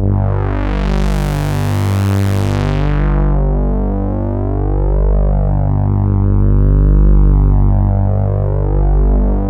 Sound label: FULL ANALOG; Sound Gallery - Charged